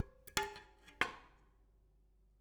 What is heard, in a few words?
Bicycle Spokes